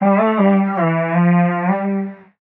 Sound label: vocal aura Gm; Slate; AURORA Sample Pack; Vocals